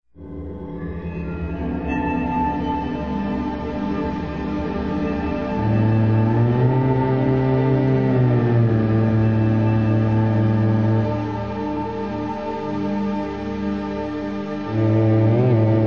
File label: score sample; Voices from the High School